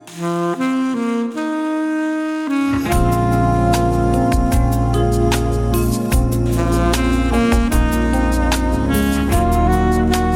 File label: alto, sax